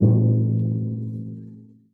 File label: tympany, misc percussion